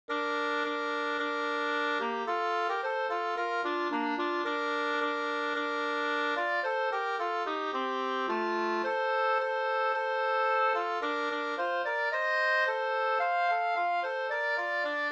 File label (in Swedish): vismelodi; Gustafs skal